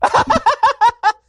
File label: laugh; NoAgenda; ben shapiro